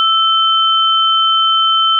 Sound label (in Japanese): 正弦波（定常）2本, 仮想ピッチ; 音の高さ